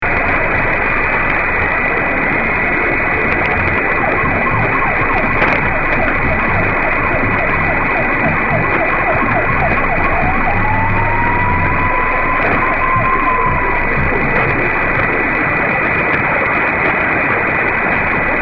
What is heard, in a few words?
sirens; 1710